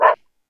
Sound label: Animal, Yamaha DD-20